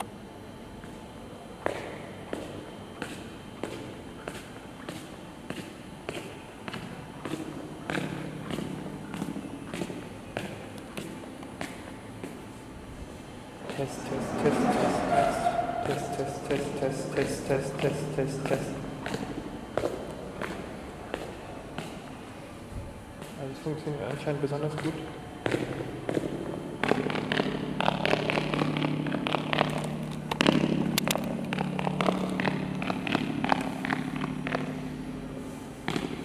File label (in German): darmstadt hbf echo kuppel